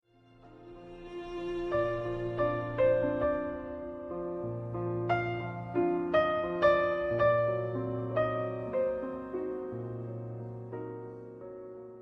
piano

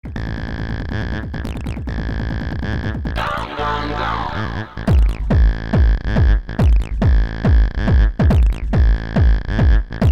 cool bass beat

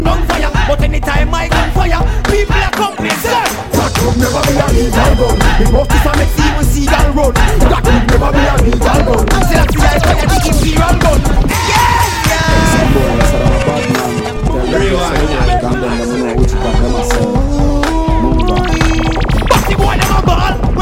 bugle gun

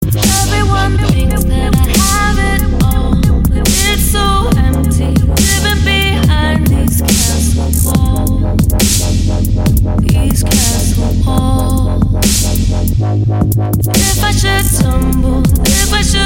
dubstep remix

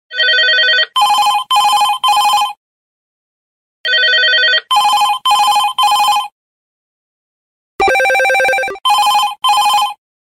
modern business tone

